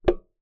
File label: Key Noise, Melodica